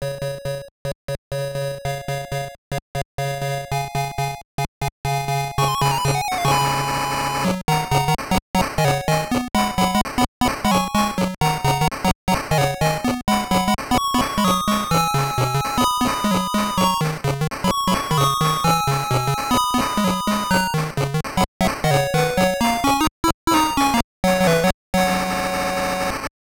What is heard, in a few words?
BGM; quiz gear fight the game gear